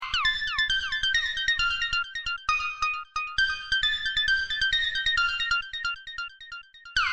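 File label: minor sequence 134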